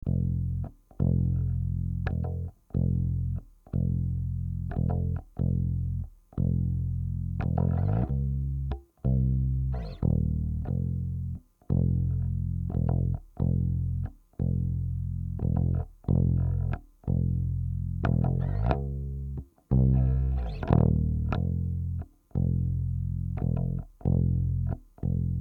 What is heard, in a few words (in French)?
basse